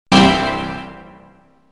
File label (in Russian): akkordeonnij torzhestvennij finalnij zvuk